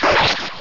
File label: Slash; pokerogue; battle anims